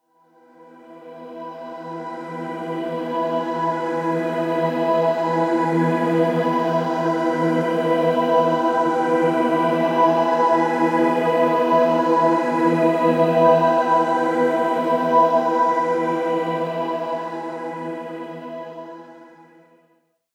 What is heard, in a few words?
Pad E; One-Shots; Pads; Ghosthack; Color Bass Essentials